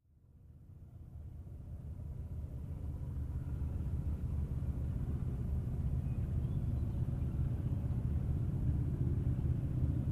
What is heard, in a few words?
Big Engine By Only, diesel